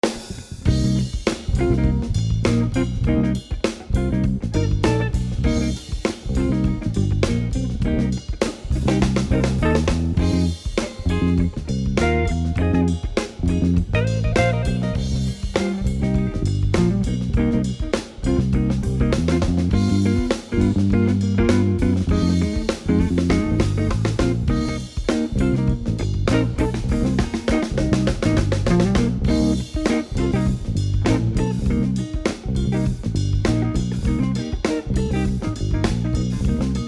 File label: Funky Blues Drumming